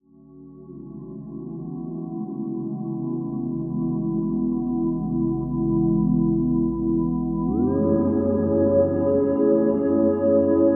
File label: portamento, string synth; starlab; 32-voice string synth eurorack z120